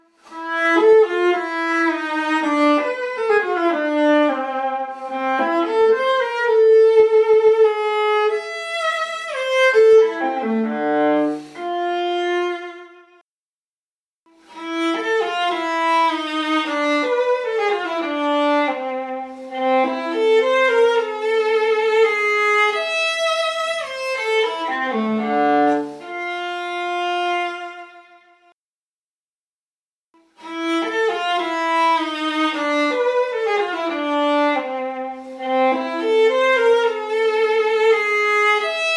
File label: Arpeggione; Guidantus1692; Piasentini2006; Piasentini2017